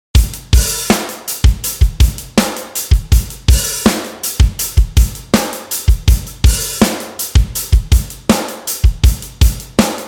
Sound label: 162 POP PUNK LOOP BIG OPEN BEAT